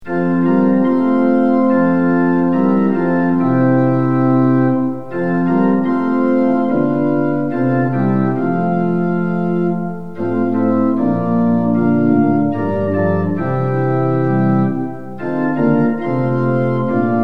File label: tune; hymns; lillenas